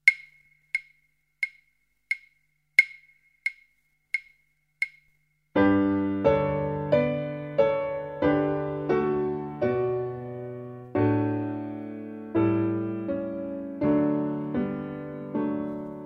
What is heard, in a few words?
Windscreen wipers backing track